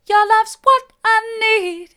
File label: mid, vocal